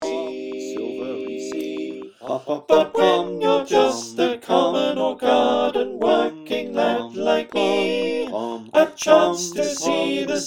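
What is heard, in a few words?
soprano alto tenor bass, choir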